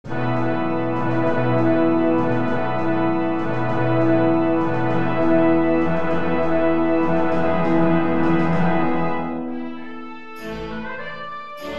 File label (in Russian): muzyka dlya kino